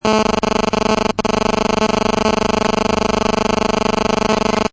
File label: Electrical Noise